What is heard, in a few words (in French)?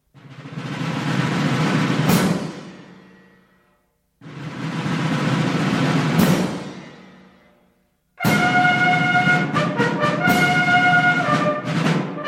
clairon, ringtone; honneur au soldat inconnu